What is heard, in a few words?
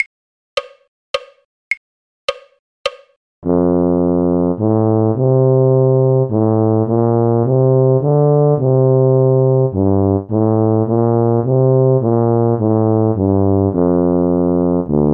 tuba; carols